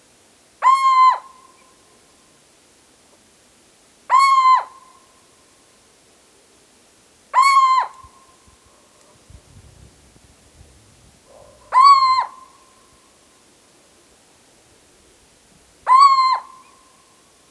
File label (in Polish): kontakt samica, sowy